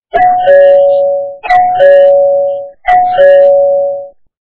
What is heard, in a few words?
Door Ring